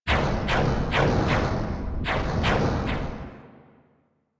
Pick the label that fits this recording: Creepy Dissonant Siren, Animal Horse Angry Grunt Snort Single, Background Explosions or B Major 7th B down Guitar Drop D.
Background Explosions